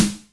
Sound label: Roland MC09 Snare